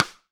RIM MIX, AMBIENCE RIM

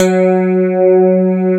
CLAV, FUNK FM CLAV; East West; Twisted Textures-BT

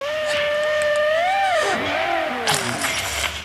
Kaos FX, Ambient Grooves

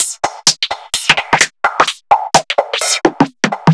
Beat, 128bpm, uk garage

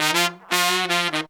horns, 160 bpm; 90 s Sample CDs; Zero-G; Phantom Horns

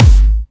Clubby Kicks, Bassdrums

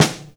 SN, Ensoniq ZR-76; 300 Drum Machines

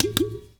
Niger udu; Spectrasonics; Heart of Africa 1

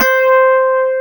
ELECTRIC PF, Piano; AKAI S6000 CD-ROM - Volume 1

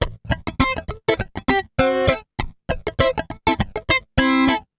FUNK, FUNKY GTR

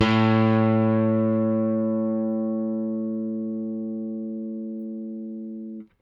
RickThumb-C, RickenbackerBass

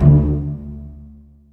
CL PZ D1, strings pizz; ILIO; Kirk Hunter; Virtuoso Strings

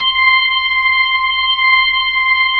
B-3, Jazz

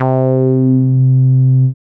wow bass; Dance; BASS SOUNDS; Masterbits; Dance Trax

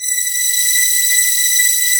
COOL VOX B5, COOL VOICE; AKAI S-Series CD-ROM Sound Library VOL-7

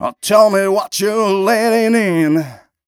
m 127 mel a, Vocals

